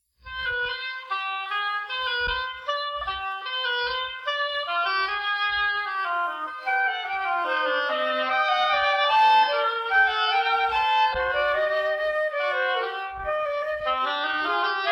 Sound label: Fugue